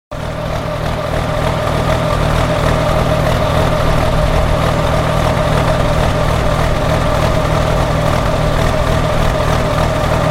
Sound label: U.S Army Truck, U.S Army truck engine running; Trucks; Vehicles